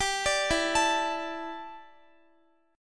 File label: E Minor 11th guitar chord; arpeggiated